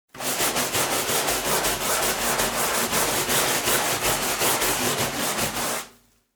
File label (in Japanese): ohuro